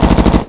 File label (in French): mitrailleuse, tank; svencoop